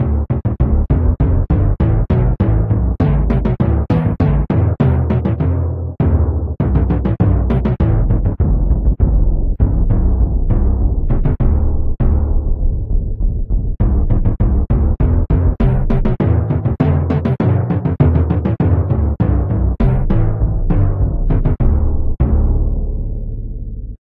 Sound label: Drum; Zanduvar Carack